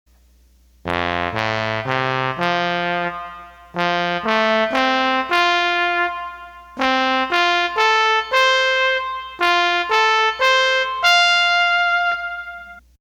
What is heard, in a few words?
Trombone